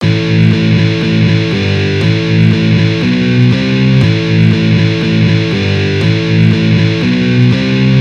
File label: Easy Guitar Riffs